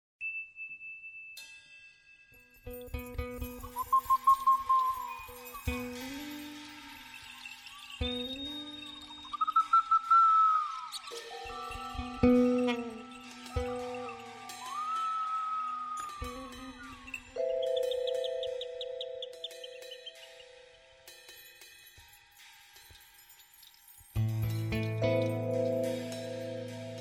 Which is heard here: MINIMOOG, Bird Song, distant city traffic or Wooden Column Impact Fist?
Bird Song